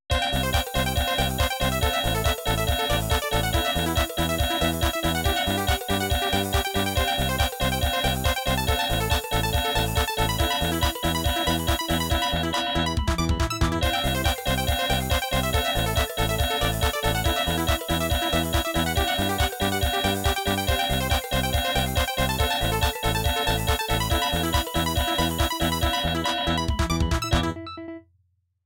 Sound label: Trance, midi nokia